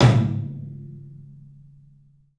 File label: TOM, 3D Drums, CLUB TOM; 3D Sound; CLUB 12 TOM